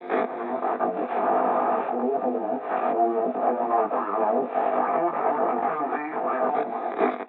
ambient battle; World Of Warships

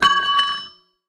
cannon shell drop

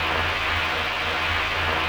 hacking interface seek

